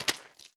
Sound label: mud footstep; Adam's Venture Origins